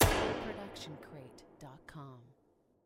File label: Gun Shot Woods Reverb; SoundsCrate